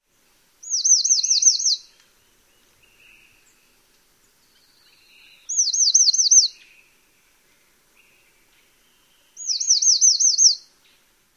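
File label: song, Bridled Titmouse; AZ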